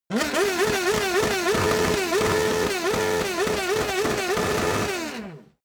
racing car f1 rev brutal close

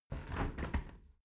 window close soft

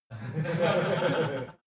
laugh male short

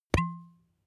glass bottle plop

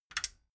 metal button stereo click power on

